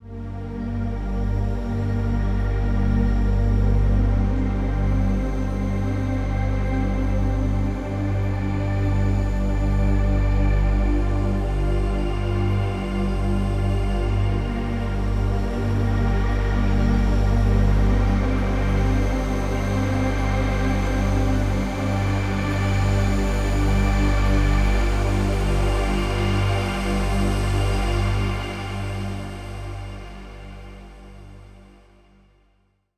Pad Full 138BPM, G minor; Unlooped

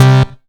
Synth Shot; Vengeance Samples

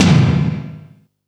Percussion; Vengeance Samples; VEH2

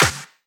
Snare; Vengeance Samples

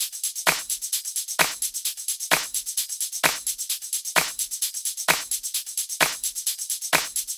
130BPM Kit; VFH1 130BPM Remnant Kit

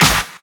Clap; Break The Walls

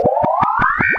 Sweeps Short

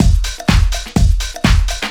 drm 125, drumloops; house; PS-28 Vocal House Samples; realworx

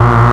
Synths A#; Vengeance Samples

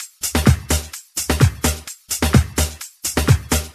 Percussive Loops 128BPM; Vengeance Samples

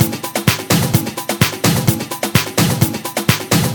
Latin House Loop 128 BPM; Vengeance Samples

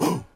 Chant

